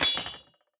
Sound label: Drop Sword